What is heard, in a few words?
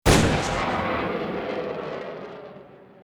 Bullet Time; FXHome; Bullet and Gun